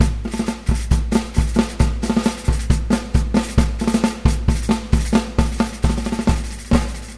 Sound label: 134 bpm 00s drum groove A sharp key; drum loops